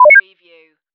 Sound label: digipanel